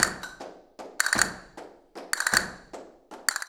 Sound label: CASTANETS, Flamenco